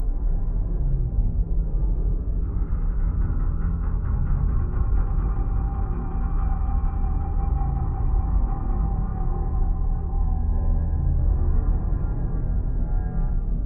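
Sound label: ambience starbase salvager; Stellaris; overlord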